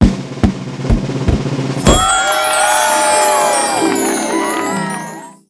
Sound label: LOTTO GOOD